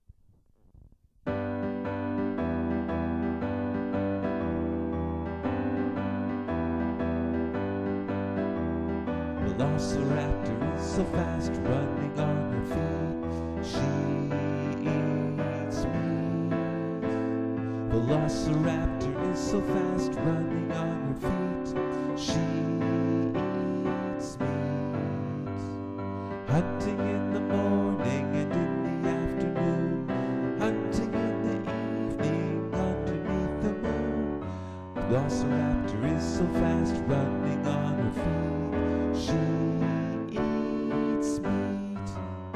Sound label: velociraptor song, French